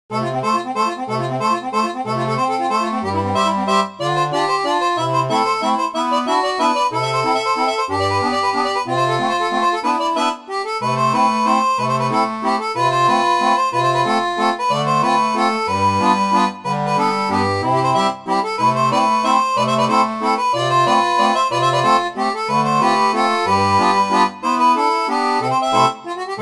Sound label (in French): orgue barbarie